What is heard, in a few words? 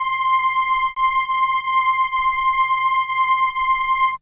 Horn C6, ARP Solina; musicradar-synth-samples